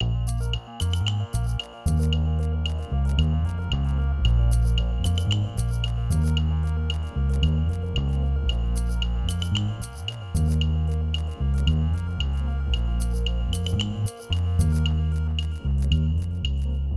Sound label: Ghetto Loop